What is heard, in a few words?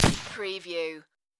Machine Gun H K MP540 Single Shot